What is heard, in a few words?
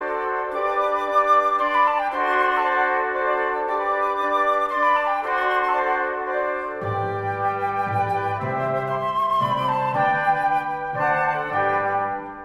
classic, game music box